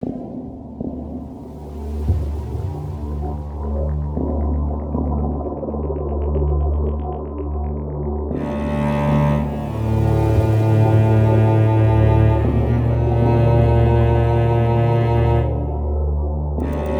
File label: Music Main Theme intro; Foreclosed